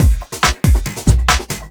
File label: Breakbeat; Vengeance Samples; VEC2 Loops